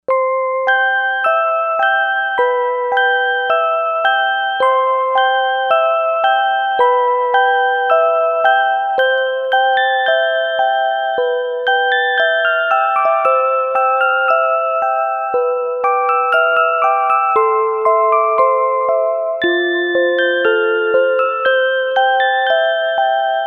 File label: Lullaby Bell; Novation UltraNova; Factory Patches